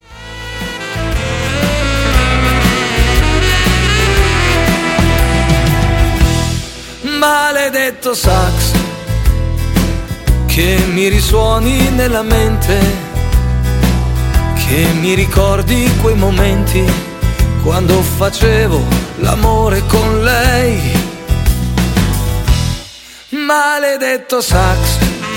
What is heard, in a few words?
sax